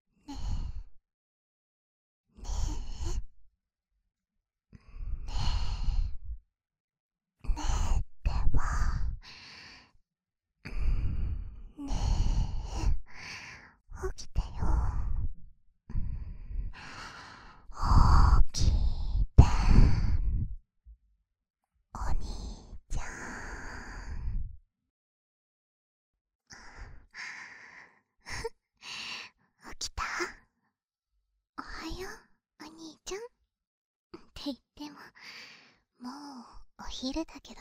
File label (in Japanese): キスと耳舐め